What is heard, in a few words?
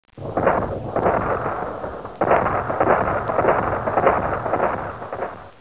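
Anti-Aircraft Fire; Soundsation; Weapons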